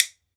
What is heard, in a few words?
WOOD BONE, Celtic Percussion